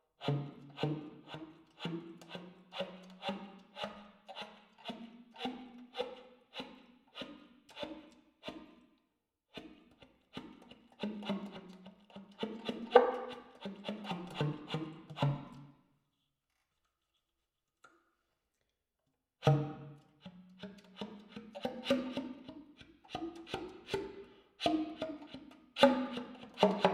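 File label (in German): alt, Tongue Ram, Zuerst mit, dann ohne Mundstück. Alt; saxophon; spieltechniken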